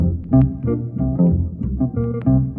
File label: bass, Loops Phonky